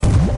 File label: SHELL